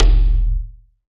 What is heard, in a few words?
Dawul, Percussion; Roland BK-5